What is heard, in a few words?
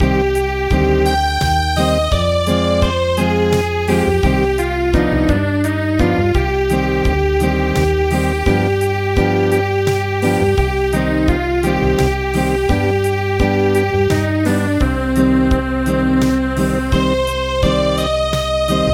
Acoustic, traditional